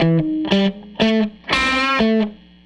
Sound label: Les Guitar Loop